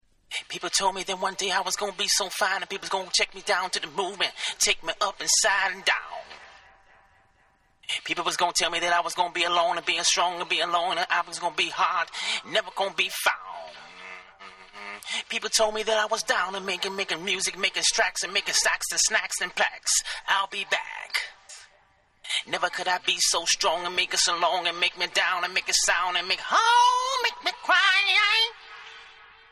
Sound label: Acappella